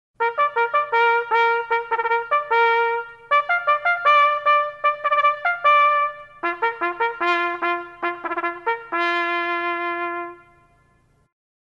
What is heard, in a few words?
Mess Call